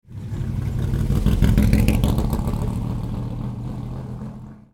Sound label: 1965 Corvette 327